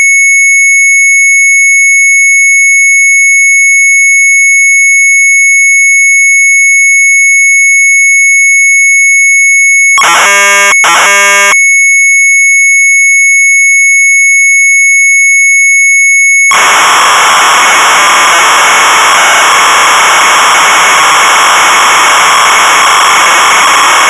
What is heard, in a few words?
MZ800 Tape, sharp; FROGGER; retromelsarchive